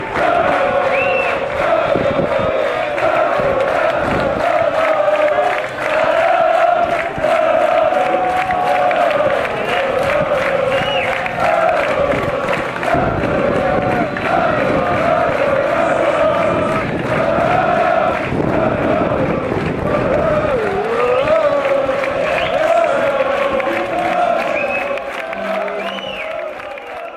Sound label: woah